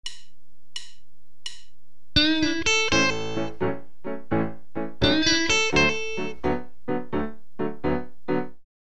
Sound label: Riff showing typical blues phrasing, guitar